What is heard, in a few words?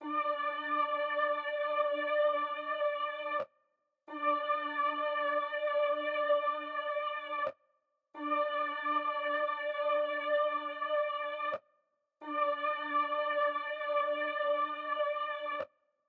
Pad, 118 BPM D Min; Melody; Melodies; Loop Stems; Cymatics; 2022 Melody Mystery Expansion; Sacrifice